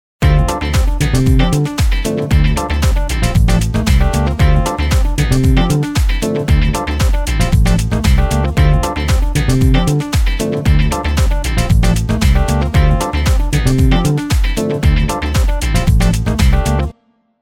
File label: simple bgm